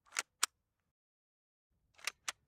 PPSh41, Handling, Pulling Back Bolt, submachine gun; Tokarev; MKH416; Pole Position